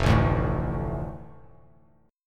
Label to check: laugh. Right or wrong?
Wrong